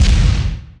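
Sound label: small mine; forsaken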